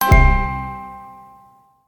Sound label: count up end; games; Beauty Fairy